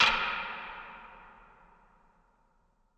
Creepy Wood Hit; SoundsCrate

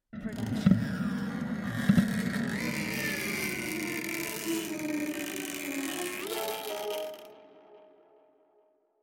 horror noise; soundscrate

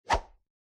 arrow swoosh

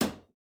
Metal Footfall; soundscrate

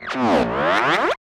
stylized glitch sound; soundscrate

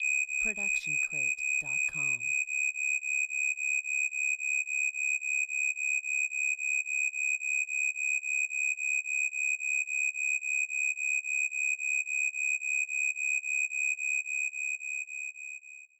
insect chirps; soundscrate

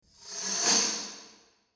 tambourine roll

